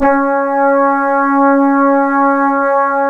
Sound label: C#, Synclav FM, BRASS; Big Fish Audio